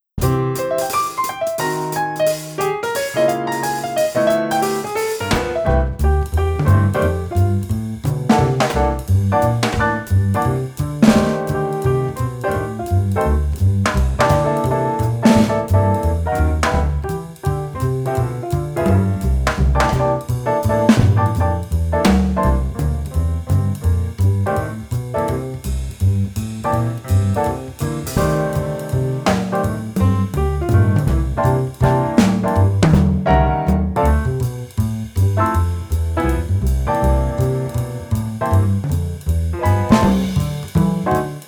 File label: minus sax